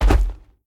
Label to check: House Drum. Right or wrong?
Wrong